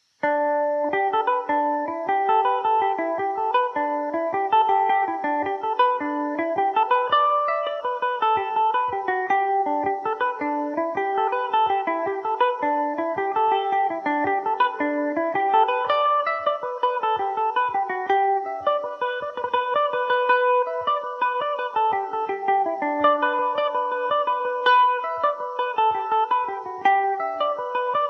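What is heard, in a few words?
G major revelation, irish mandolin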